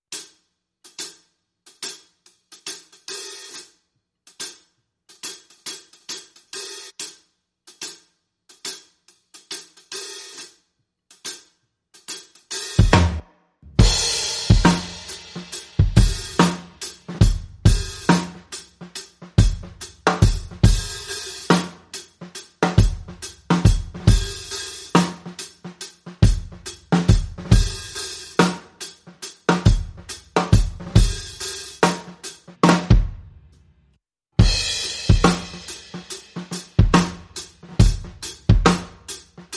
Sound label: Groove Drums and Perc Only